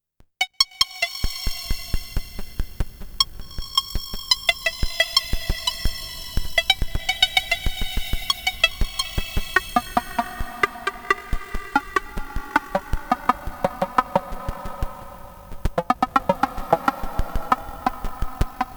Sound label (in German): AnamonoXmini Hall Audio Out; Gotharman; Pluggy Stretcher